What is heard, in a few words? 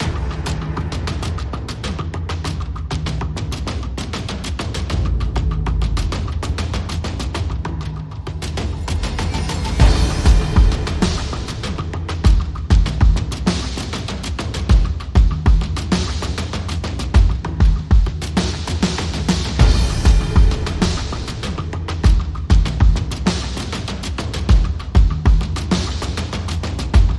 Drums and SFX